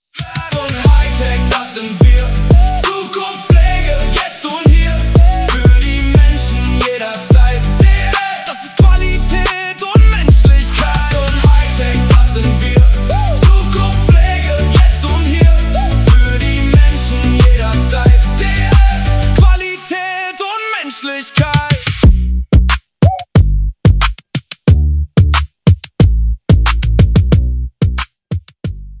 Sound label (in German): Song Klingelton